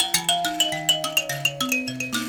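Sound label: grand mbira phrase, African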